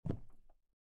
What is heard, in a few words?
step wood; out of reach treasure royale 2020 gamerip pc